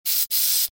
spray

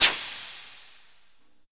bounce; bounce pad

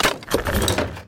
buy

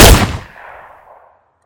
weapon; Old Trusty

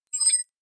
feed

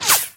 whizz

